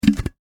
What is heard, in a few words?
Foley Lid Plastic Close Large Container; Materials; SFX Bible 6